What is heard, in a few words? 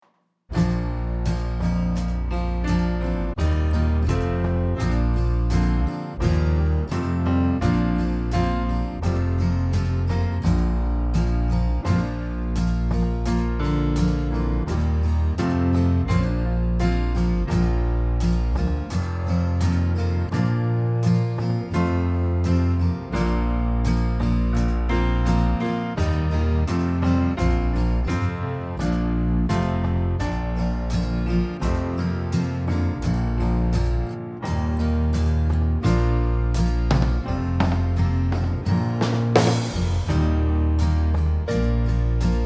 performance track in bb